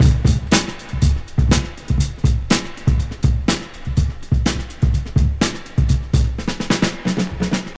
122 bpm drum groove f key, Free drum groove - kick tuned to the F note. Loudest frequency: 1061Hz